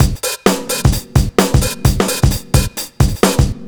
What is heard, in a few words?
Live Breakbeat Fill, Drum Loops 130bpm; Zero-G; Soundclash Nu Dance Electro; Acidized Wavs